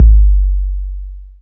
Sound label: phat steel kick drum sample g key